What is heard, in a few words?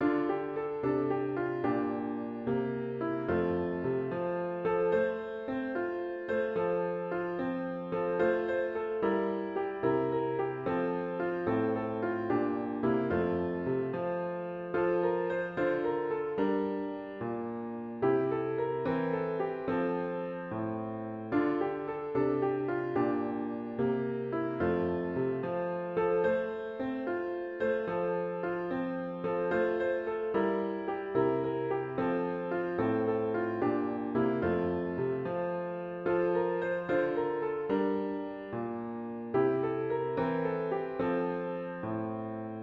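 Hymn piano with Intro; worship service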